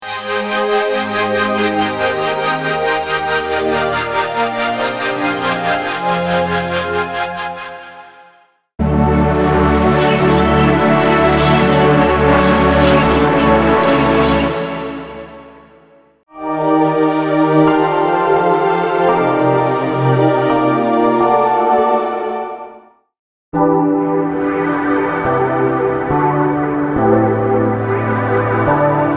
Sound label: Chords Dubstep & DnB; Unison; MIDI Wizard